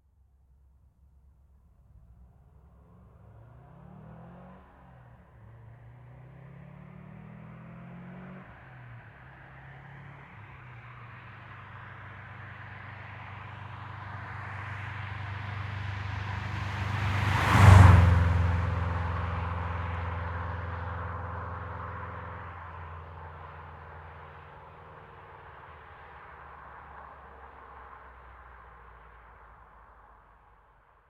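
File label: Subaru Impreza GD WRX Exterior - passby constant rpm 90kmph ground stereo; Soundholder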